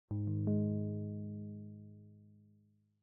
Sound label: screen sharing; mail; odoo